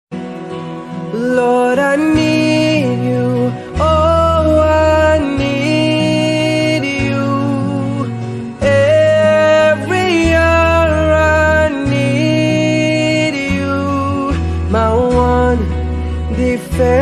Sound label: christian bgm ringtone english christian song